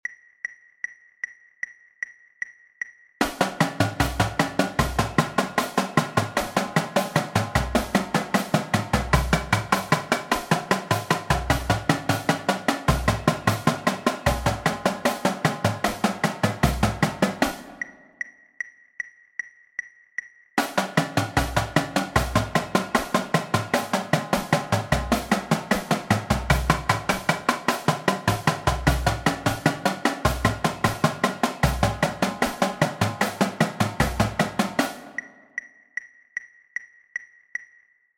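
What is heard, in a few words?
sequence, Drumline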